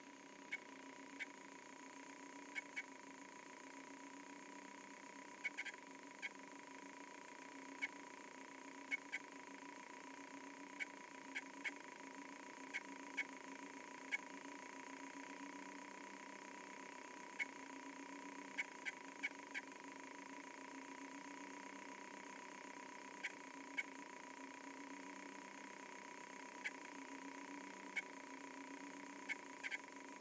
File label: Startup Noise